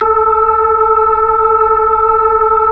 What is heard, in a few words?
B-3, Keyboards of The 60's and 70's; Club Xfade